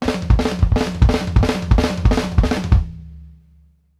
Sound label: furious; Sampleheads; New York City Drumworks VOL-1; KD FILLS 84